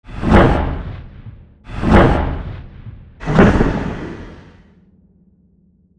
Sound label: Backfires Slomo; 空踩油门2